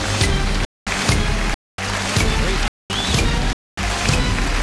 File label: beam